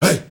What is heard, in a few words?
HEY HEY